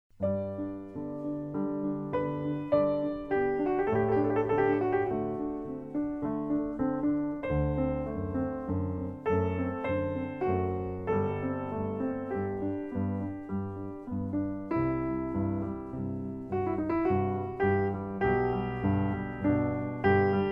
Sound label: Sonata in D for piano four hands; W.A Mozart